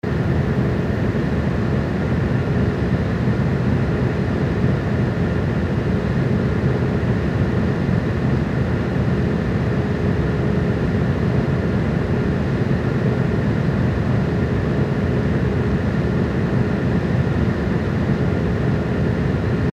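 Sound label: noisy interior atmo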